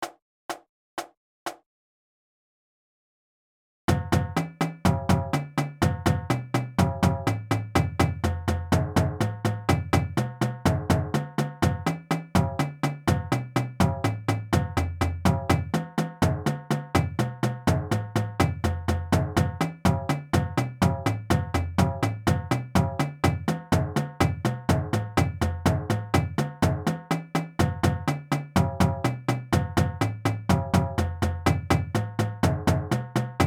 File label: Drumline Warmups